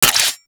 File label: Crossbow Mech C; Tenno; Garuda